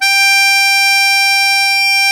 MUSETTE G3, Historical Instruments; USB Soundscan vol.23; AKAI

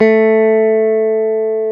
GTR, Dual Guitar; Club-50 - Foundations Roland

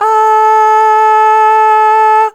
Aah Short A3 Dry

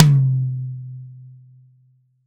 H. Tom, Roland BK-5; Toms

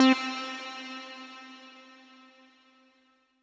Synth C, Synth Prophet One Shots; Electronisounds

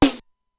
Simmons SDS-9; drum machine; Factory D2

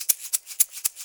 MULTI EGGS; Best Service; World Colours

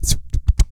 copter face, Vocal Planet Blues Soul; Spectrasonics

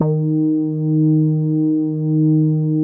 BIT BASS, CRUMAR BIT01, Vintage Keyboards; Big Fish Audio - First Call Vintage Keyboards

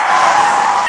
CAR STOP; AKAI S-Series CD-ROM Sound Library VOL-8; SFX

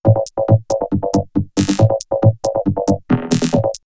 Vocoder Loop; X-Static Goldmine; VocoderLoops

